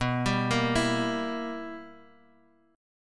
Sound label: B Major 7th Suspended 4th Sharp 5th B Guitar DADGAD